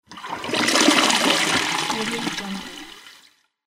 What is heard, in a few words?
toilet flush